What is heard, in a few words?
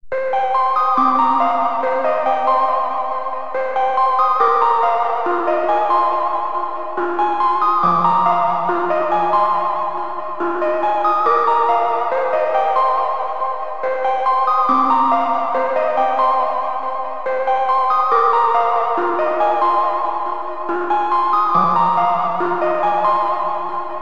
Favourite Pluck